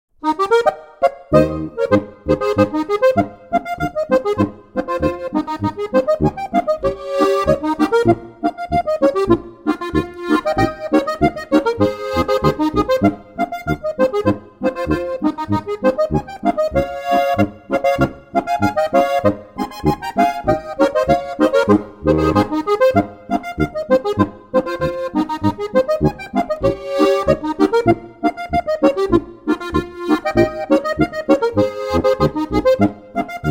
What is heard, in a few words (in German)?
boarischer